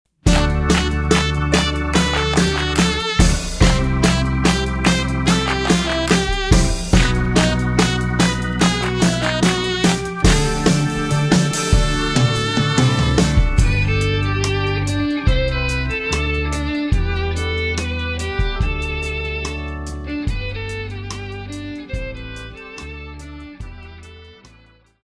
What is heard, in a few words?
Instrumental